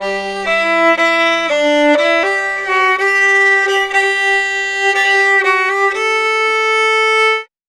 Fiddle, Appalachian; Continental Drift Aftershocks

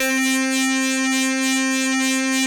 700 MOD1 C 1, Korg; Back In Time Records; Korg Universe VOL-1

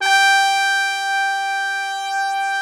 BRASS G4, ORCH BRASS; Greytsounds VOL-1

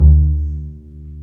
STR Cb Pizzicato; Roland L-CD702; Cb Pizz 1

